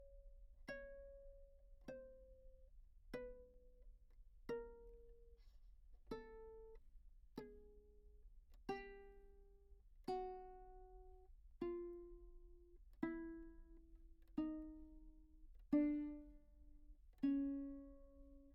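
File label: tenor viol pizz chromatic descending, Anechoic; OPENAIR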